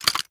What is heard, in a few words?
contents, ammo clip rapidfire